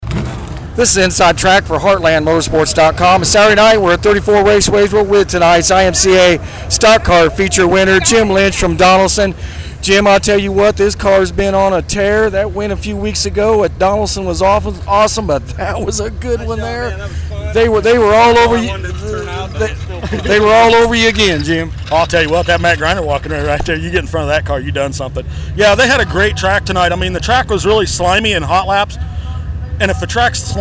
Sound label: Raceway IMCA Stock Car; Donnellson; 5-28 34 Raceway